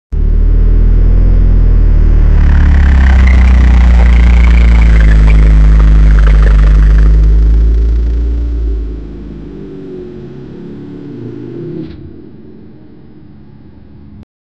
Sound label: create a clear sound effe, sports cars